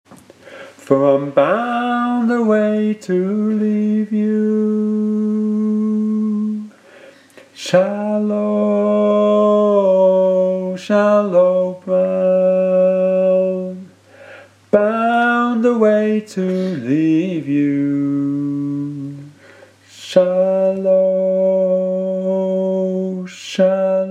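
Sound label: Shallow Brown - tenor, sea shanty teaching track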